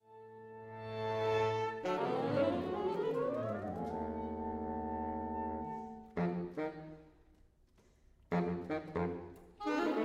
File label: Saxophone Quartet